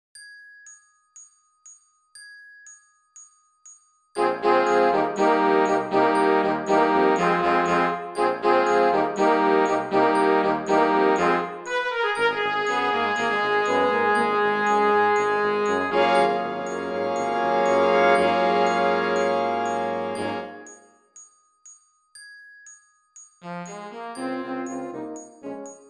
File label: score w click